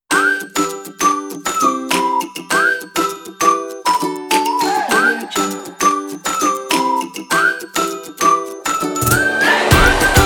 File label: fun upbeat rock pack